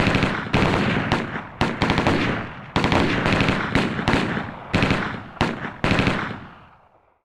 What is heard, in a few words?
gunfire; ambient